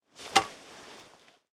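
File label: Weapon baseball bat equip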